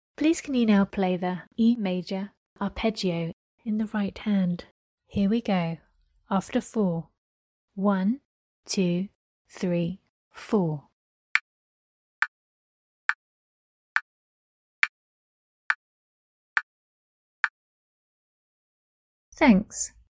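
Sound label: E maj arpeggio right hand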